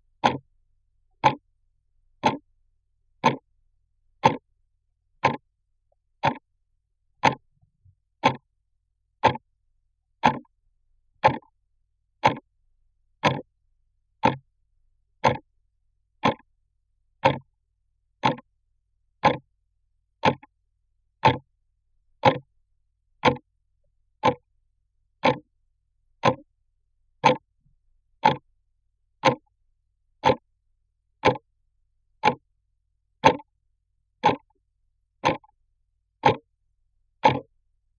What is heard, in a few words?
Clock Tics Contact